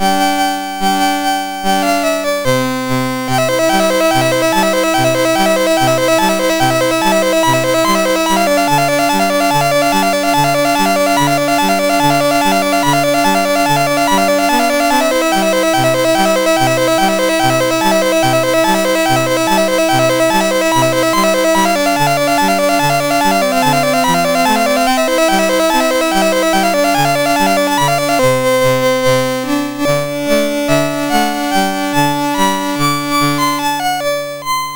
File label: Circus, MOS6581R4